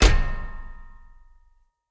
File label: metallic chest open; factorio; Factorio 0.14.21